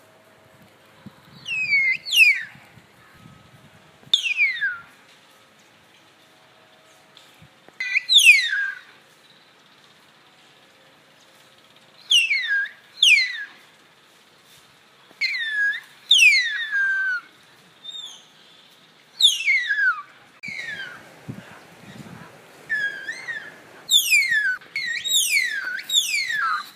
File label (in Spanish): Danta Sonido